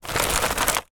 household clothes pegs wooden in plastic pot movement; zapsplat